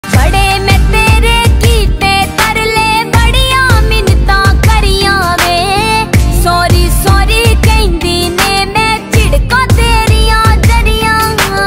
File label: punjabi ringtone